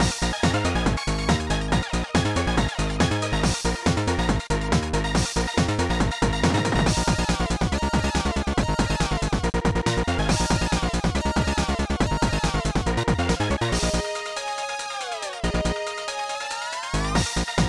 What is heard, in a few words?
BGM Cheerlead Tut